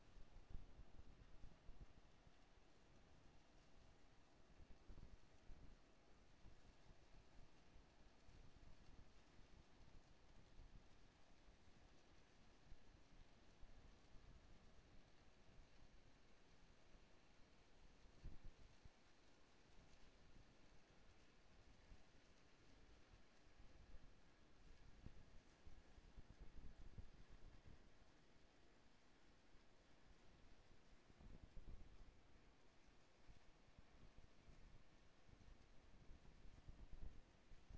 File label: HYDROPHONE 16000Hz; JASCO AMAR HYDROPHONE 740